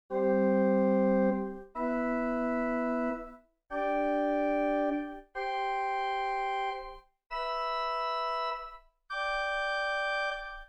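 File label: Pedal Mixture arpeggio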